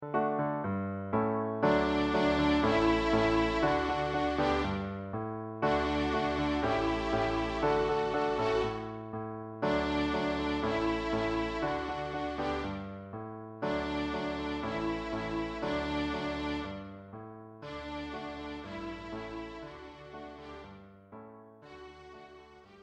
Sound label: STRINGS ROCK